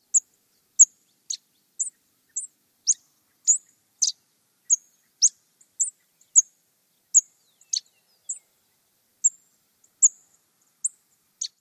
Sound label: series of calls; AZ